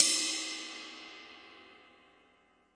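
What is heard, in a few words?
Cymbal, classic hip hop; STYLE KITS; Classic Hip Hop Mega Kit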